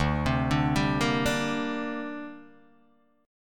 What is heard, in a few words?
D 7th Suspended 4th Sharp 5th down Guitar Open D